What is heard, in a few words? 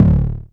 DTOM, Klone Dual-Percussion-Synthesiser; Drum Machines